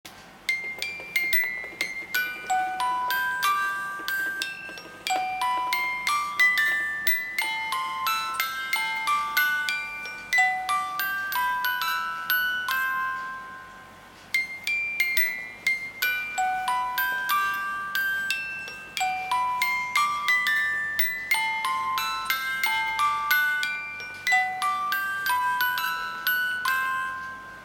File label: Music Box